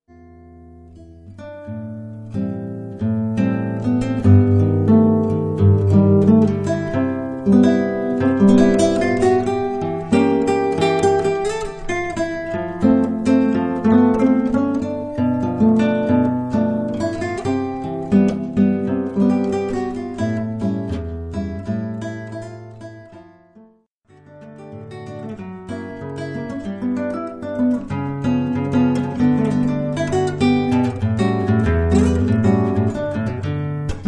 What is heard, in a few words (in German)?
sologitarre